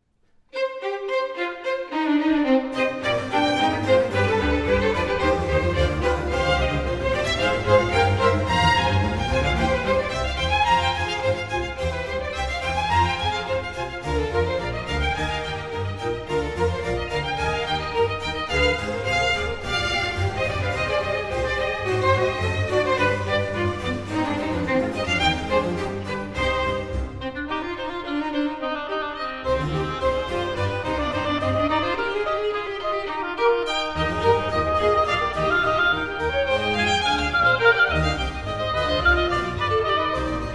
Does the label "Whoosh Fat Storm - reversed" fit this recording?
No